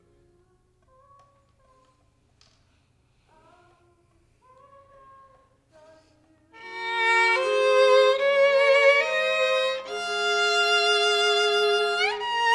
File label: violin